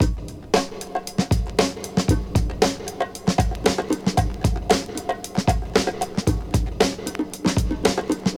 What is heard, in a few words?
115 bpm fresh drum groove g sharp key